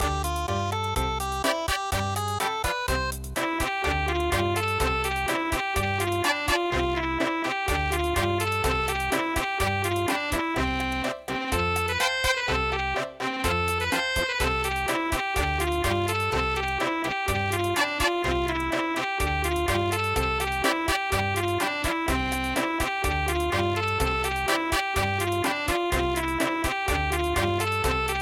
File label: Cha Cha Cha; Peixe Vivo